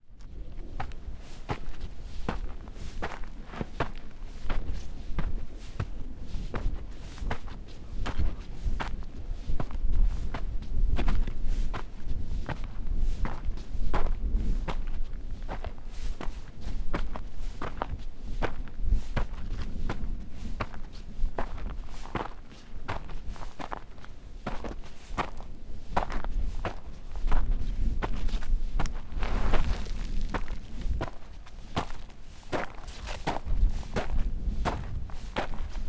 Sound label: Steps In A Steep Path